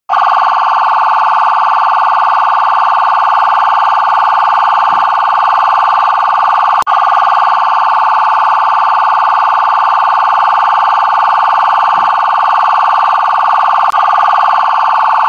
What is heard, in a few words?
police sirena; deathmatch; [BVR]Sirene